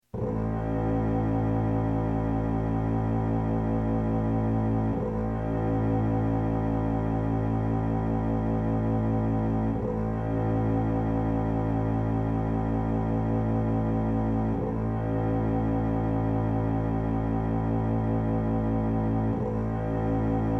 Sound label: Tuning A Bass Violin Sound